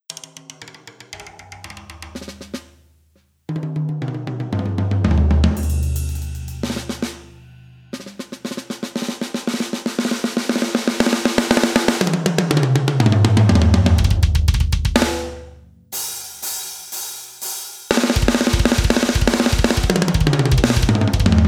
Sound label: Kit Check (Snare High, Plastic Kick)